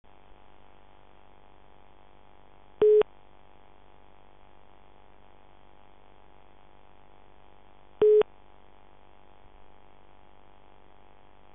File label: call waiting tones